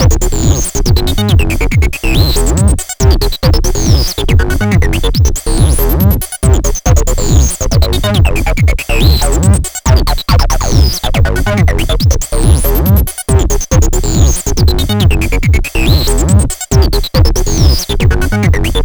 Fuzz Capture, acid; 100 acid vol 1

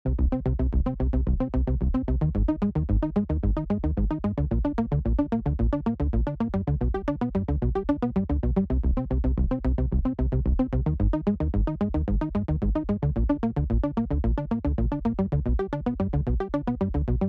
Dance Bass, retro 80s synth wave; retro 80s synth wave presets abx3 vol1

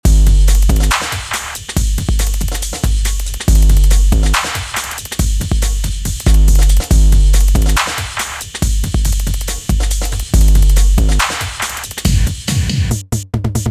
808 Trap; drum; beat; Drum Blaster: The Ultimate Beat Generator, Sampler Plugin for Modern Producers

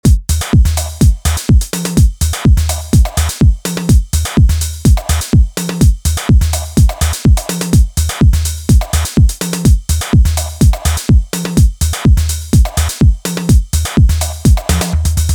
House; Drum Blaster: The Ultimate Beat Generator, Sampler Plugin for Modern Producers

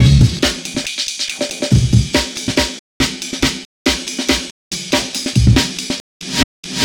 Amen Breaker 140Bpm; vst fx bundle

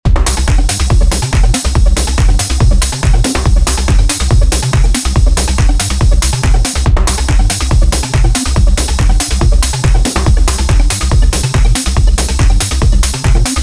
Disco Bass and Spooky Arp; neo retro 8 bar loops and midi files

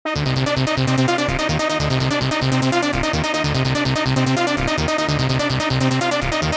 Loop, eurodance 90s; Nice-Loop

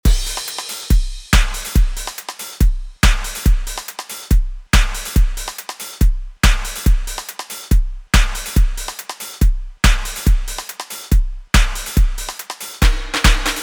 DuB; drum; beat; Drum Blaster: The Ultimate Beat Generator, Sampler Plugin for Modern Producers

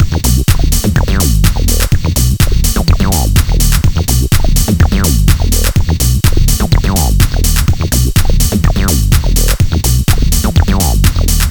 BASS, acid; EAZY BASS; 100 acid vol 2

